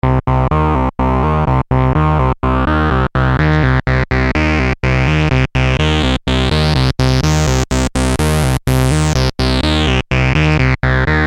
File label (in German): Polivoks pro sequenz